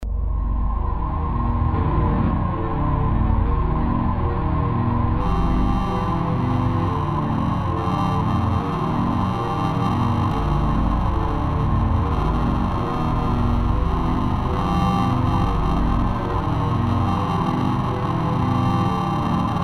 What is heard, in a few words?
dark ambient